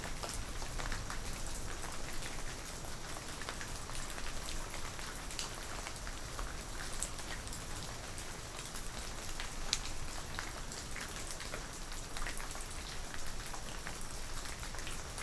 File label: Rain aftermath at cave entrance sparse; boom library; Thunder And Rain